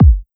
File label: Kick Subbass